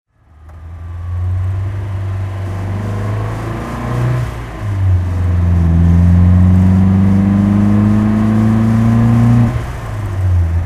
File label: mg mga 1500 roadster drive